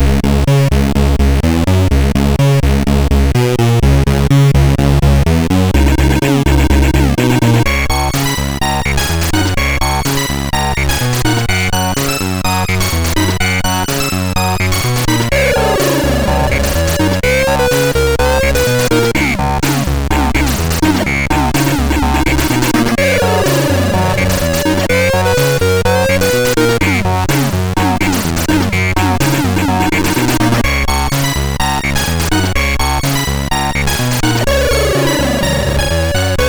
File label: intro, sid MOS6581R3; Crazy Sample II intro